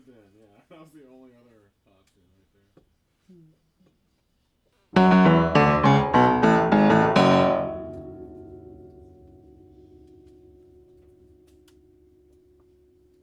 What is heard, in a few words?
Piano; keys